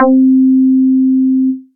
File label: Octave Looper; Press Keys; Inspired by Nature by Dillon Bastan; Vector Grain Presets